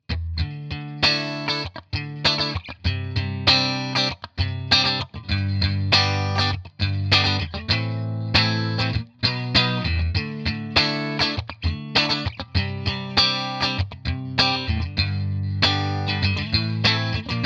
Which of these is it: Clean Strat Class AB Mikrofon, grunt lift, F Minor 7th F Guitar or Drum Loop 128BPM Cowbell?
Clean Strat Class AB Mikrofon